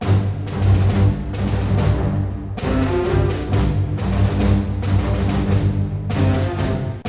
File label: BOLE, ORC Bolero; East West - Scoring Tools